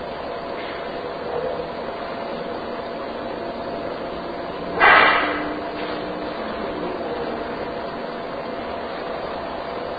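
Room Entrance to Attic Unexplained loud noise Amplified; Turner Home; 2nd Floor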